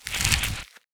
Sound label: Burrow in; Hero Karkinos